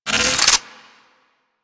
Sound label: Reload, Weapons; Strun Prime